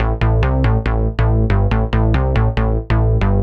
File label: TECHNO BASS 140 A